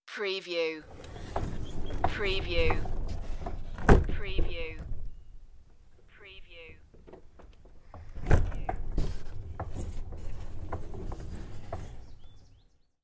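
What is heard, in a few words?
Barn door Open Close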